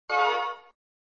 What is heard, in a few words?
Sound SFX, flipper